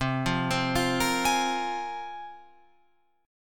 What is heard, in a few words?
C 7th Suspended 4th Sharp 5th, Guitar, Open F; C7sus4#5 arpeggiated